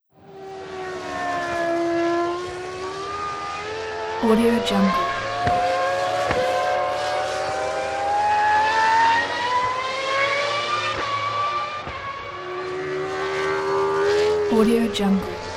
formula 1 grand prix cars; soundsvisual

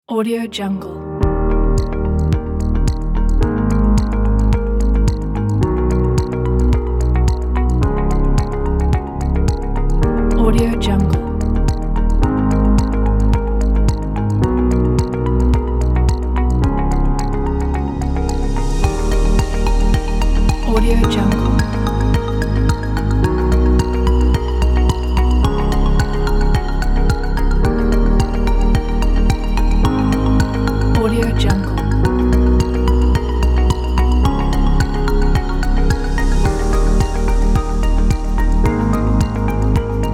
corporational tech

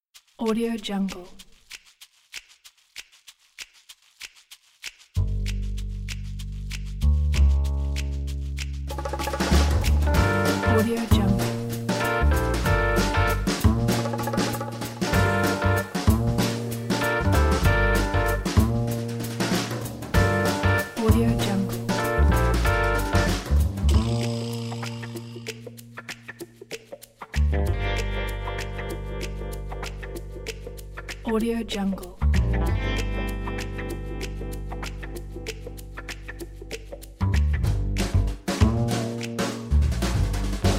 sneaky dramedy tension

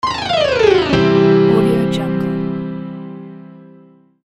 piano glissando wrong answer

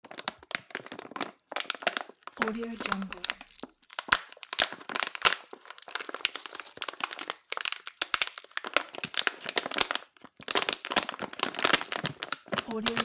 sound of crackling

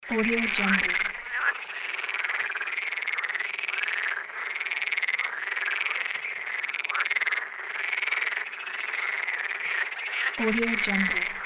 frogs croaking

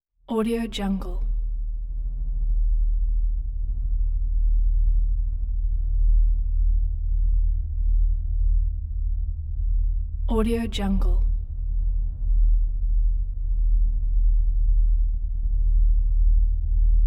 low rumbling ambience; hollywoodedge

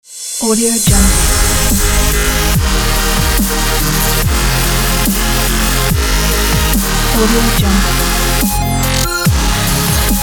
dubstep bright jingle

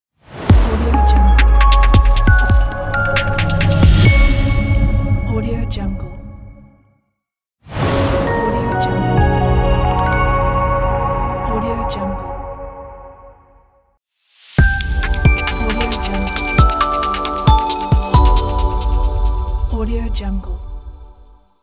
digital media logo pack; kirillluzin

